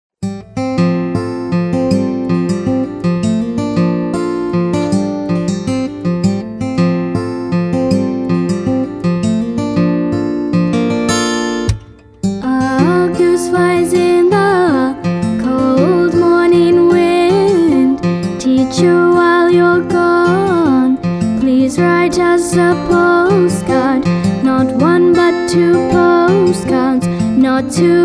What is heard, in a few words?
Wild Geese (English)